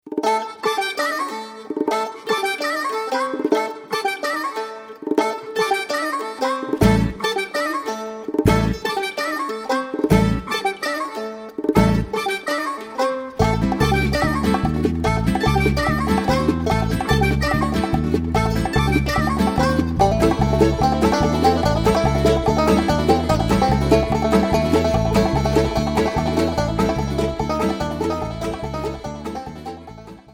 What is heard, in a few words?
Blues